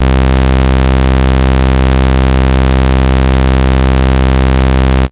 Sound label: zzub, Synth